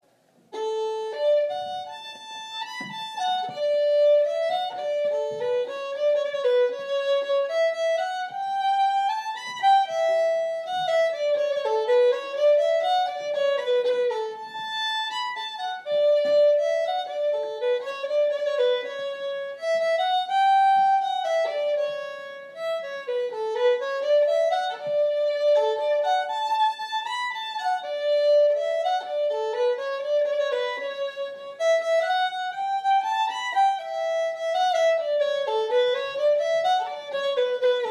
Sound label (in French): Valse